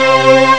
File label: orchestra; orchestra rep; Amiga Soundtracker Sample Packs